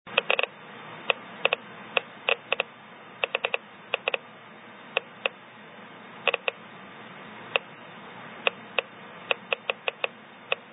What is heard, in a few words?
Uranium glass stopper 1 inch 10 sec